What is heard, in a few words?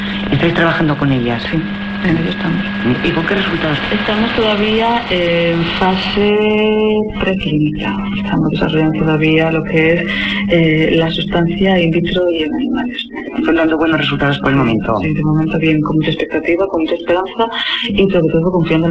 180hz hum